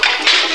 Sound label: gun reload